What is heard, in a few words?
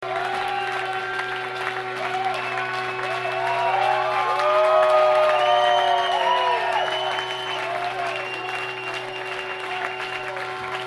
applause & banter